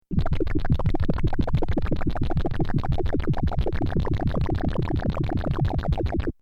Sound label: Bubble Machine Liquid Gurgle Pump; Cartoon; Cartoon Sound Design